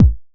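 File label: 909 kick short, E-MU Proteus-2000; 300 Drum Machines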